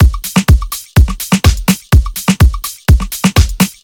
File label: Afro Bounce, Drum Loops, 125 BPM; Sonic Foundry (Sony Creative Software); Underground UK House & Electro